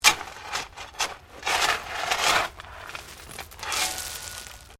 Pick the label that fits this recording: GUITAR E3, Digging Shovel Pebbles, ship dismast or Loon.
Digging Shovel Pebbles